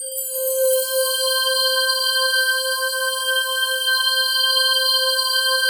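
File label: PAD, atmospheric pads; Best Service; XX Large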